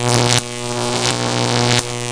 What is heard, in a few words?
Iris Elec; Playable Tutorial Level